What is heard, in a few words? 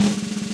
Snare Roll Loop, Yamaha DJX 2B